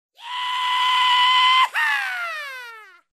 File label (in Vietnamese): het keo dai khi nhay bungee